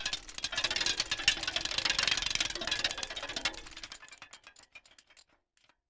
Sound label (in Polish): obracanie kolem